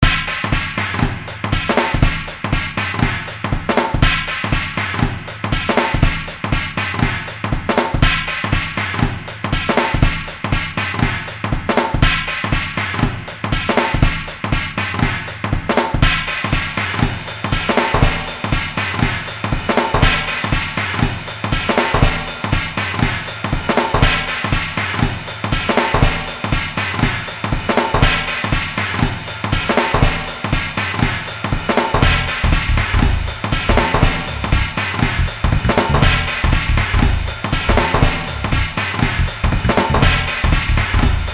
crazy bag of jazzy drums

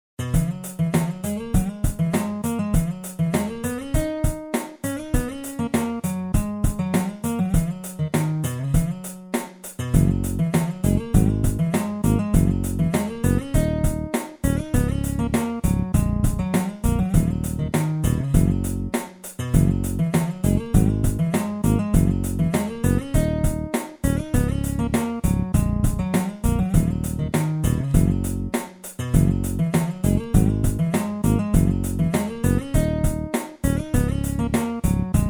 yehaw country

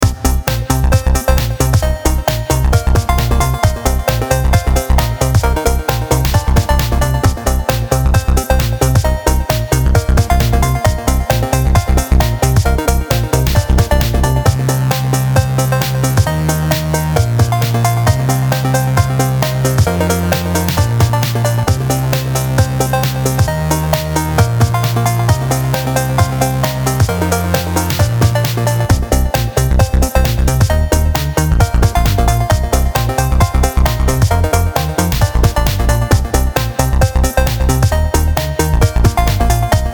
turgid horn

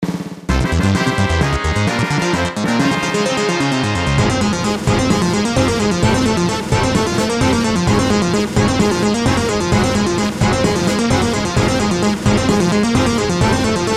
Fanfare; FF7 REMAKE

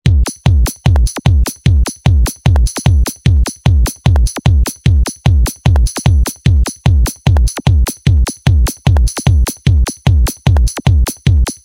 Techno In The Kitchen

